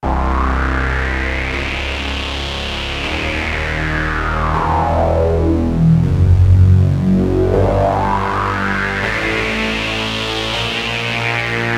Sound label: octascale pigtronix